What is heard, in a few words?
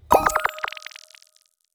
Collectable Pickup